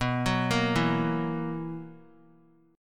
B Major 7th Suspended 4th B; BM7sus4; arpeggiated

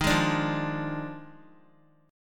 Eb Major 7th Suspended 2nd Suspended 4th, Guitar; EbM7sus2sus4; strummed

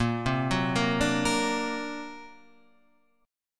D Augmented 7th A sharp, guitar

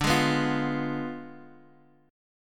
D Diminished 7th Guitar Open D, chord

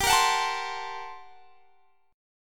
G Minor Major 11th, G; GmM11; strummed; x,22,20,23,22,20

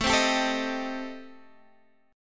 A Minor 7th Flat 5th A, Guitar, Half Step Down

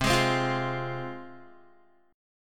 C Suspended 4th Sharp 5th, C, down, Guitar; Csus4#5 strummed

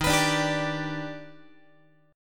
Eb Augmented Major 7th, Guitar; Eb+M7 strummed; x,11,14,12,11,13; JGuitar

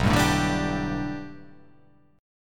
B Minor 7th Sharp 5th B, chord

